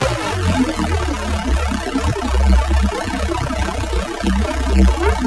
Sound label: down, ambience